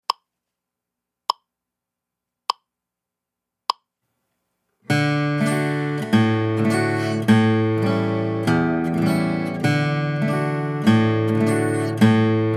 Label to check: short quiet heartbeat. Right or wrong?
Wrong